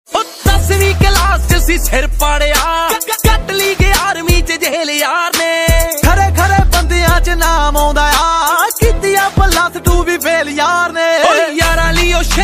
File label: attitude ringtone for boys new Punjabi ringtone